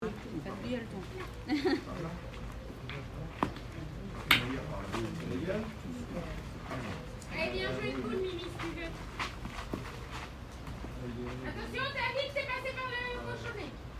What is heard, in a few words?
Petanque